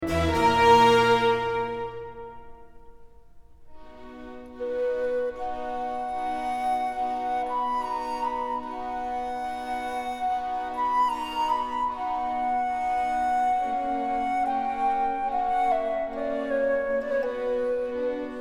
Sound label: Concerto For Flute And Strings In G Minor, Largo; Antonio Vivaldi; Op.10, No.2, R.439 ''La Notte''